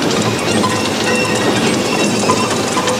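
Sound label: A#3 squawk; WAX SPROCKETS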